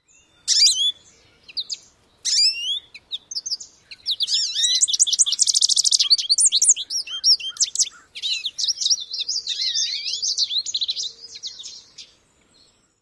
nasal call samp flight song

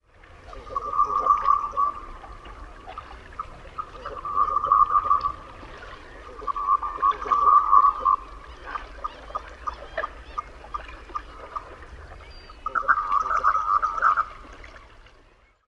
male courtship calls

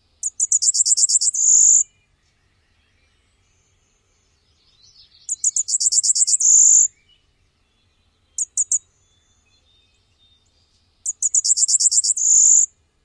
songs; NY

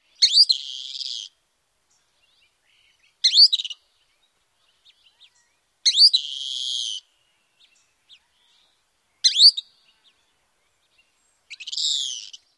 calls

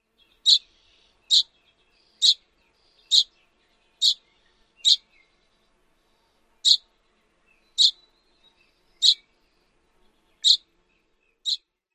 tjip calls

